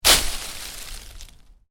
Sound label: Kick leaves, Shoes: sneakers, kick pile of leaves; Miscellaneous Impact